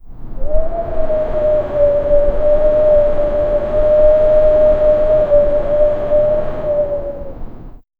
wind blowing in an abando

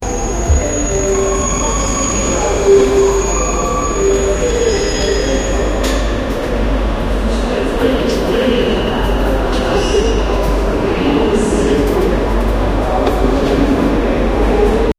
the train station platfor, arrival

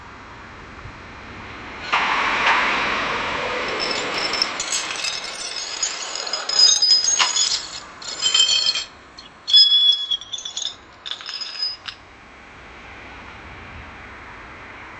the sound of a car, breaking glass

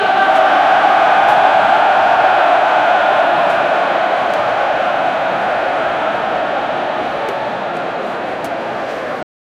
stadium during a soccer m; sport

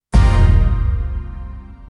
shock

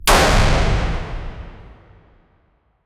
blaster shot

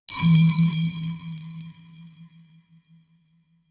collecting a diamond soun, collectible

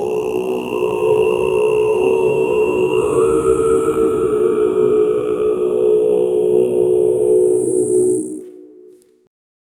tribal chanting

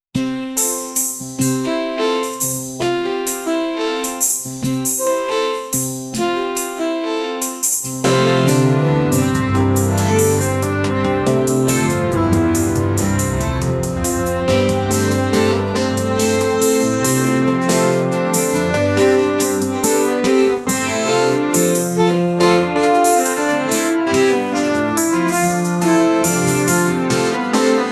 education background musi

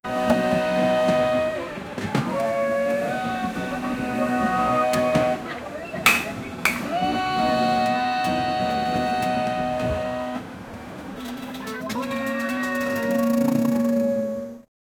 moaning, festival; bakii festivalv

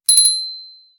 bright cheerful

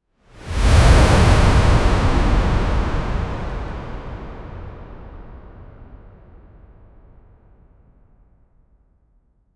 suspense whoosh impact

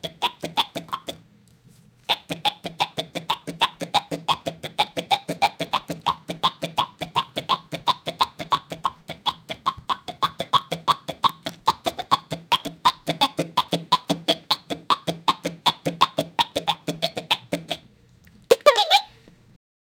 fat rideable dragoness, character

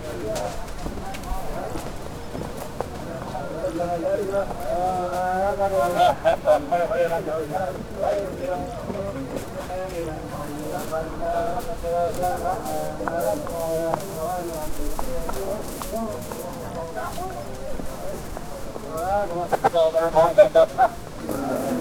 an ancient busy middle ea, marketplace

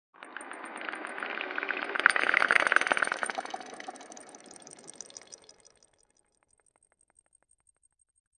short positive, dissolve

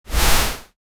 a sharp sound of a, brush stroke

